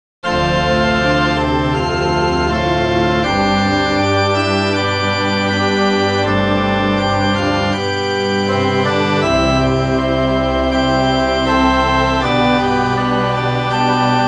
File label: backing; may the road rise to meet you